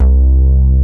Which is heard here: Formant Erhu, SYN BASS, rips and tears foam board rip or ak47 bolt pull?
SYN BASS